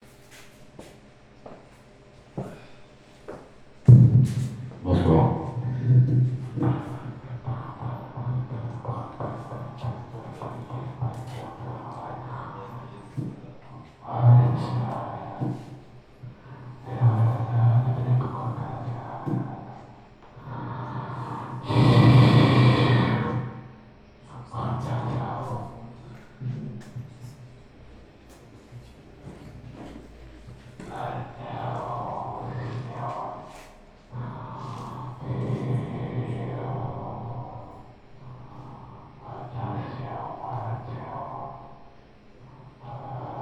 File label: Concert